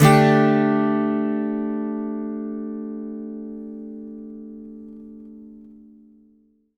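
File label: acoustic guitar D sus down, Whole Lotta Country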